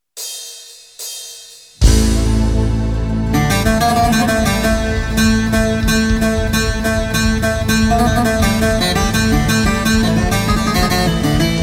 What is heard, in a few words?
Greek Bouzouki; Oussak